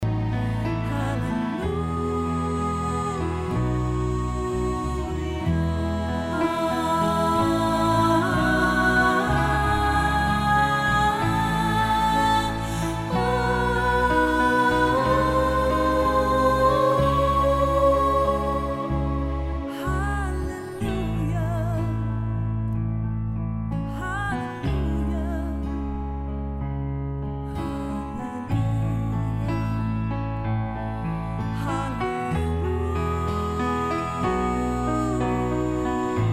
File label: Hallelujah Soprano